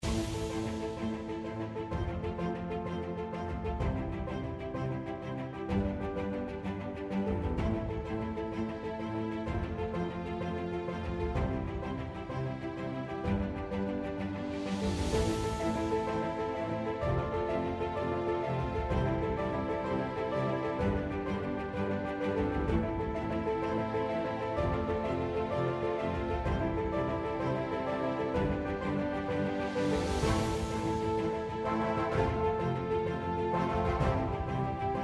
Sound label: Base Game BGM; slots; Miles And Millions